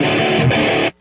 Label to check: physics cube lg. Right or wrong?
Wrong